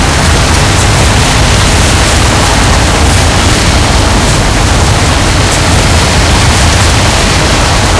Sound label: tire snow int, tanks; War Thunder